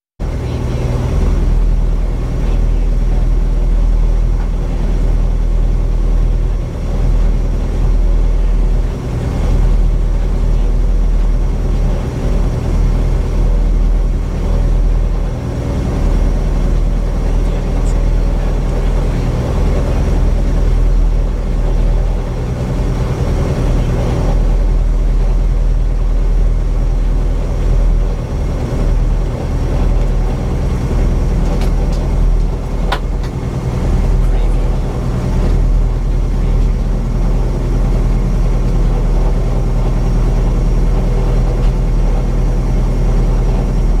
Rally car tickover, cutting the engine and pit ambience; beanstalk audio